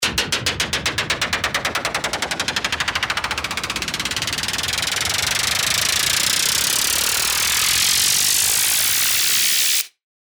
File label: Noise